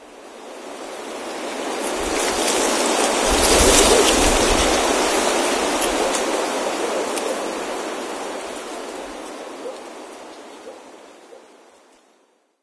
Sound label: leaves rustle; ambient; Left 4 Dead 2; Source Engine; random amb sfx